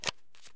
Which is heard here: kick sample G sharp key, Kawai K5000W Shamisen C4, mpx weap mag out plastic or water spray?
mpx weap mag out plastic